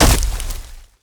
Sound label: step; gonarch